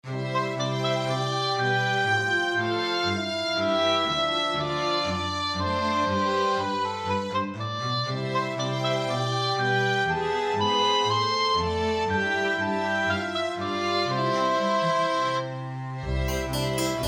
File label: Oboe cembalo; Light, classical tune. 18th-century style; BPM: 120